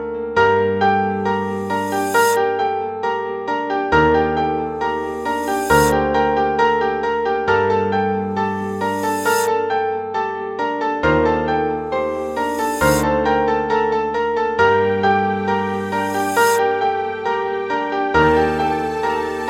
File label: requiem by piano; classical